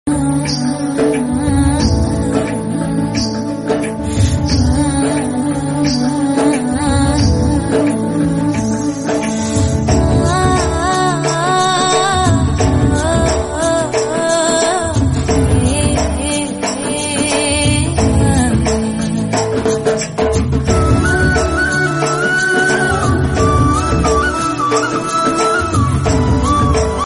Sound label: Female Humming